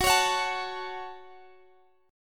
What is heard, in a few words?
Gb Minor, Guitar, Standard; strummed; x,x,16,18,19,17; JGuitar